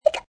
Hiccup Cute, Hiccup, child, short, cute (human voice); Hiccupping Sound Effects